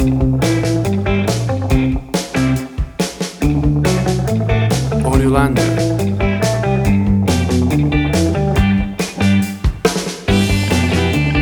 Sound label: 50s Surfing Music